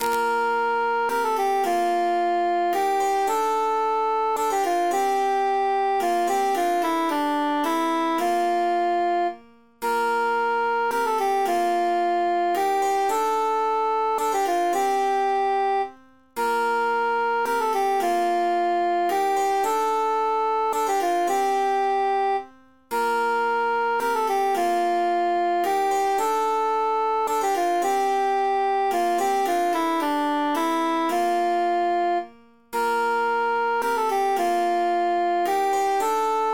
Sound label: altus emphasized; fi maris